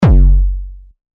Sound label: Kick